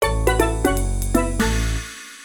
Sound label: level failed; phone; fruit factory 2